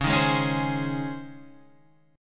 C sharp Major 7th Suspended 2nd C sharp, Guitar Half Step Down